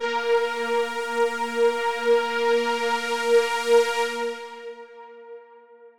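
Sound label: analog A#4; analog server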